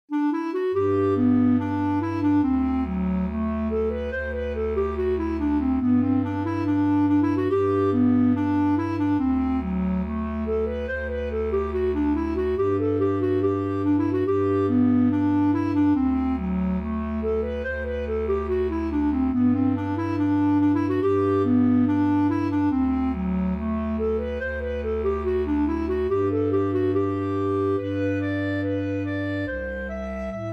Schottis med bas; efter Plog Anders

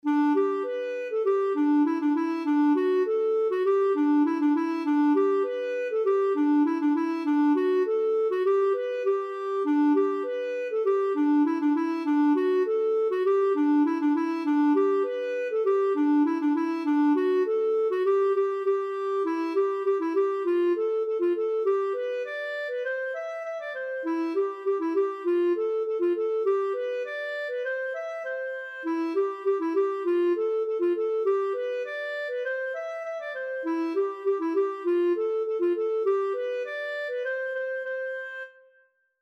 polkan

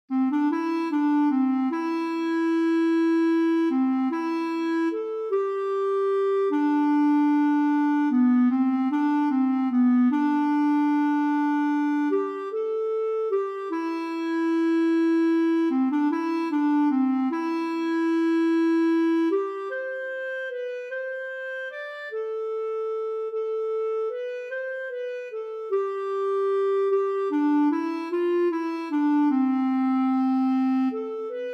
Valsmelodi

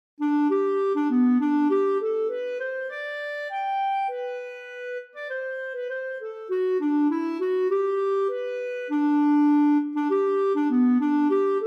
Ganglat; fran Skarsjodal

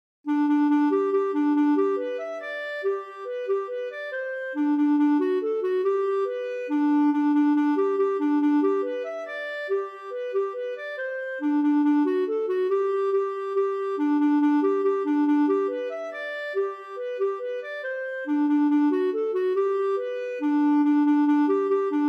Shottis; pa sagbacken

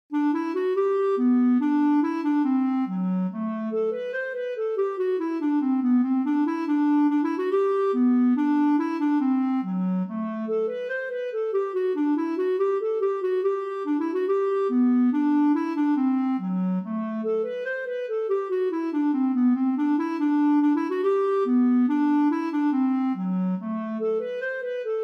Schottis; efter Plog Anders

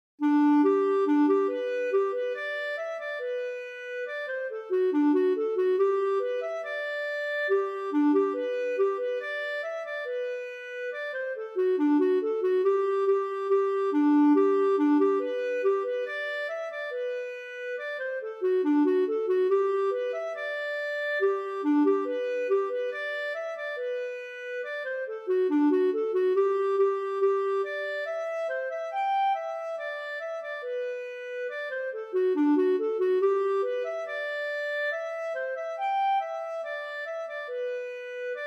Flygarschottis

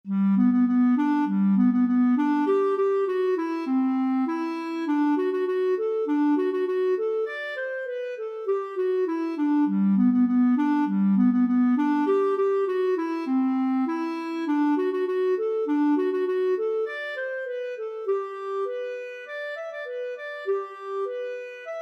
Polka fran roros

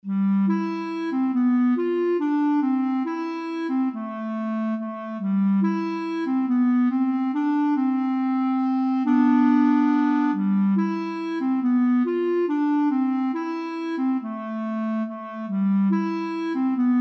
Vals; over Ljungan

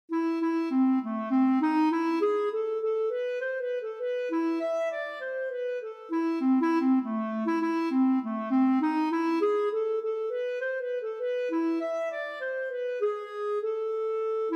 Polska; till Renate

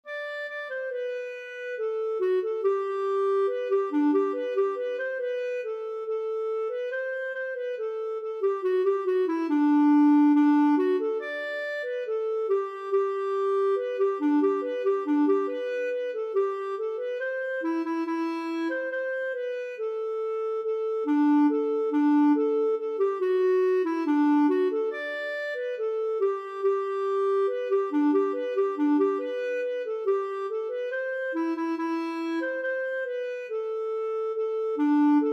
Schottis fran Porjus

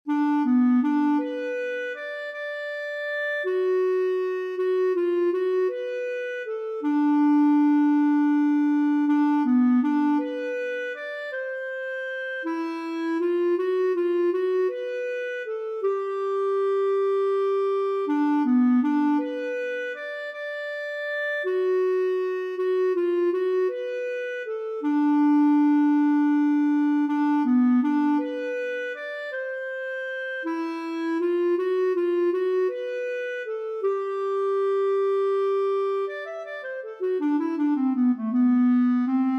vals

